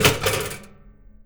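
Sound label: MECHANIC, household; AKAI Anime Sound FX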